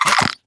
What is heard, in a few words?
Swamp Jumpers Close Attack